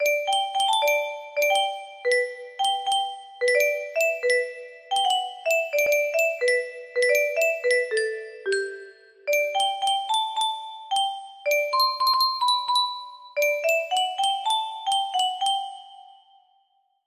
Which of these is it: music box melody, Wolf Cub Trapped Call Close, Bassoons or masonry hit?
music box melody